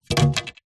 foley wood pine plank short thin 40mm x 10mm set down on soft surface